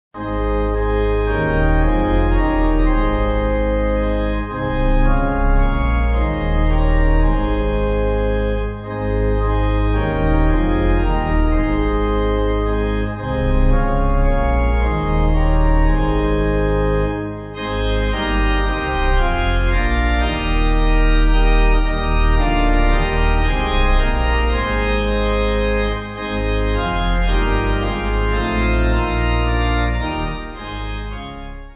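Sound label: Pipe, pipe organ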